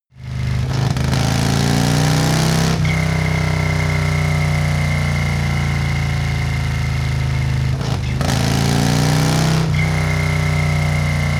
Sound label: motorcycle harley accelerate triple whistle; motorraeder; verkehr transport